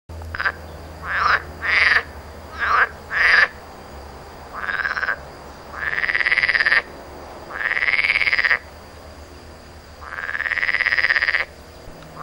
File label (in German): rufe teichfrosch